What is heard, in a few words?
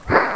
kiss